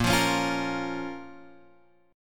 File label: Bb Minor, Guitar, Open G; 8,10,11,10,11,x